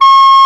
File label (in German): BLOCKFL TE C; FLOETE; Best Service; Alpine Volksmusik